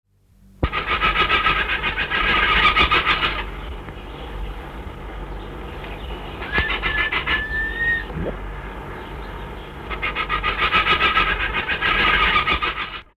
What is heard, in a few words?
Ardea alba Streit